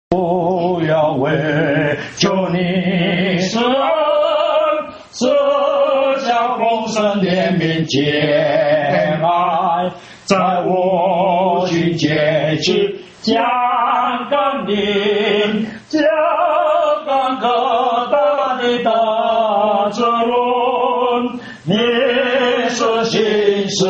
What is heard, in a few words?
hymn; worship